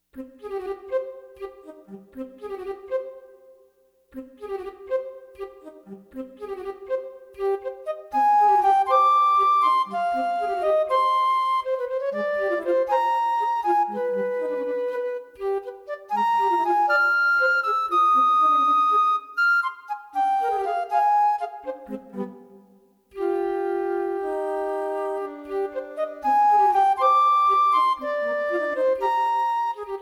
TANGO

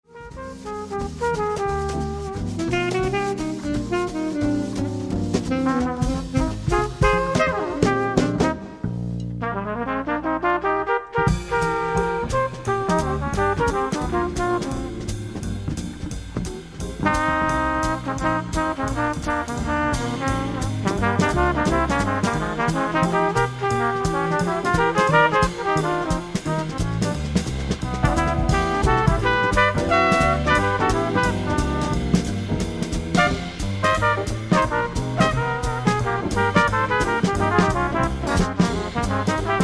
Duet Part Added

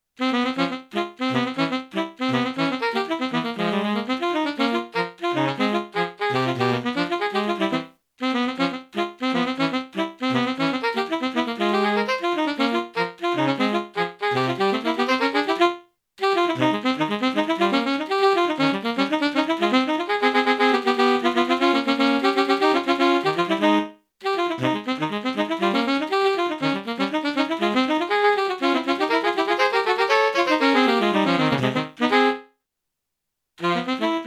Tenor Sax, STANDARDS TENOR SAX DUETS; Here are full length demos that are PANNED L/R, so you can play along with them!

